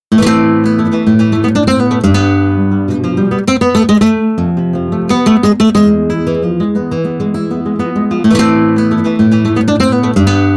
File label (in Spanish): Estilos de Flamenco